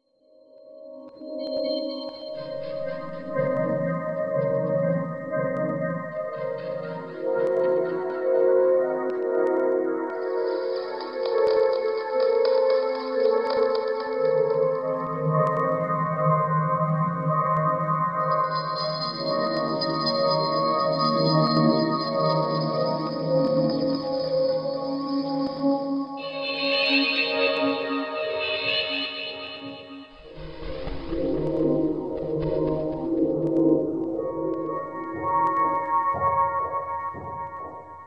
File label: A Phrygian 60, Deconstruction